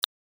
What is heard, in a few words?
navbar click